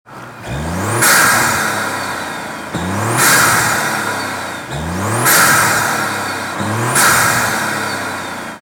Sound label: Stand, pex intake system 3.0t; ea839 s4 s5 b9; apr